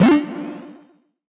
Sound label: Retro FX; Cymatics; Video Game Sample Pack Vol 1